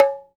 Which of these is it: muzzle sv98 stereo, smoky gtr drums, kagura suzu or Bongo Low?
Bongo Low